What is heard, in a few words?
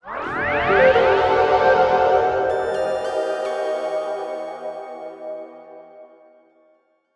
Artifact Fanfare; Metroid Prime 2D